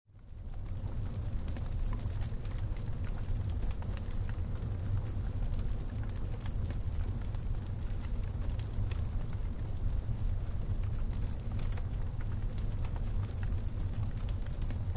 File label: Fire Fireplace, A small fire burning with slight crackle. Recording of indoor fireplace or small; Recording of indoor fireplace or small campfire; Flame